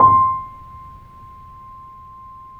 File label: C, The Piano